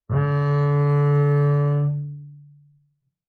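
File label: Bass arco fortissimo sul A D2, Double Bass; MIS Pitches - 2014; Strings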